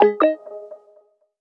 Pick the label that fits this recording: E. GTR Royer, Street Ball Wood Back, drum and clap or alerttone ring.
alerttone ring